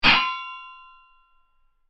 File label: Chinese blade; jidaigeki